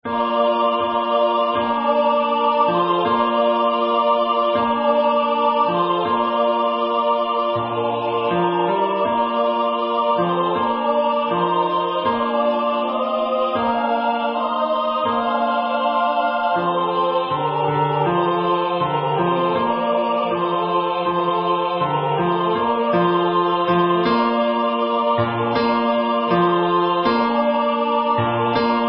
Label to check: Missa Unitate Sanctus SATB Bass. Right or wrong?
Right